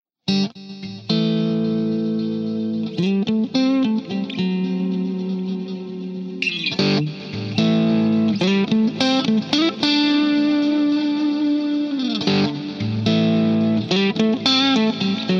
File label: Blues